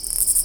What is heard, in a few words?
WALK